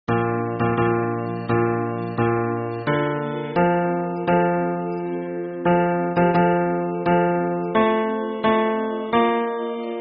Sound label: hymn bass